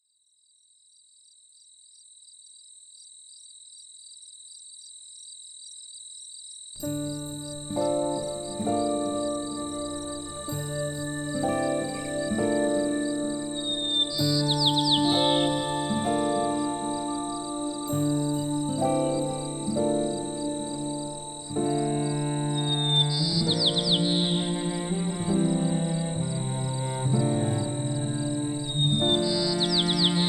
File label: Forest Cello